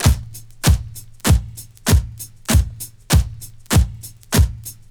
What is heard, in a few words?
slow afri; Best Service; Hyper Dance; CONSTRUCT